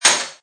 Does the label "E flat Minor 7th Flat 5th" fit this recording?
No